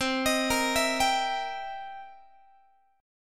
C 7th Sharp 9th C Guitar; C7#9 arpeggiated; x,20,18,20,20,18

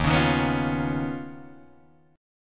Eb 7th Suspended 2nd Sharp 5th Eb, Guitar Drop D; 1,4,1,4,2,1; down

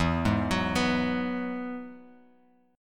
E Major Add 9th, E, Bass Standard; arpeggiated; JGuitar

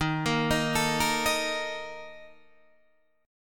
Bb Major 7th Suspended 4th, Eb, chord

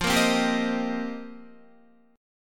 F 7th Suspended 2nd Suspended 4th, Guitar; F7sus2sus4; strummed

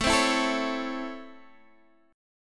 C sharp Minor Major 7th Sharp 5th

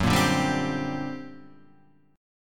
G Minor Major 11th, F sharp

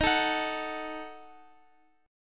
Eb Diminished, Guitar, Open F; Ebdim strummed

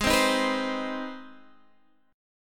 C Minor Major 7th Sharp 5th Ab

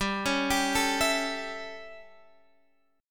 A 7th G, chord

